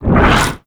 Rupture Cast; Warframe; Infestation

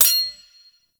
Boss Projectile Timer; Warframe; Cephalon Weave

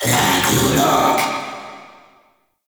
summon vocal verb; Warframe; Uriel; Vythelas

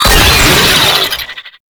Player Spotted, Vocals; Warframe; Proto Infested; Brachiolyst

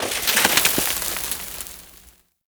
Mountain Pass Snow Fall Ledge; Ambience; Warframe; The War Within

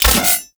Micro Spin Attack Hit; Warframe; Corpus; Arachnoid Micro; Abilities

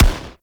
Arch Long Rifle Shot; Warframe; Weapons; Archwing

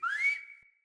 Banglenut Found Whistle A

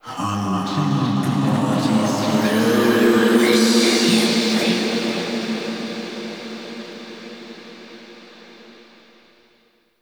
Cave Echoes; The War Within; Golden Maw; Queen

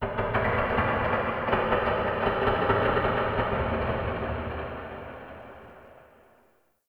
Wooden Clicks; Ambience; Warframe; Shadowgrapher

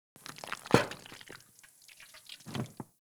Ext Goop Thick Burst; Ambience; Misc Outdoor; Warframe; Infested Eris

